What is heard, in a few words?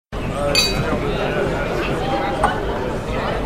tavern